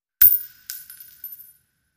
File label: Shell Casings Single; shell casing movement